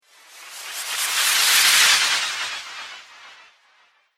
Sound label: Magic Shimmer Spell; fiction sound effects